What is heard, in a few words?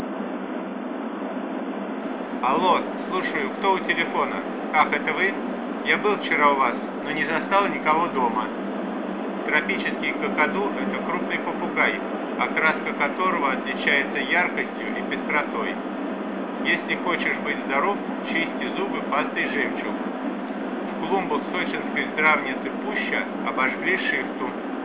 car +03dB